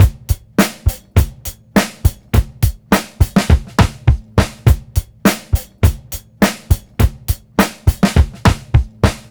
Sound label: beat, Roots Funk; USB Soundscan vol.41; AKAI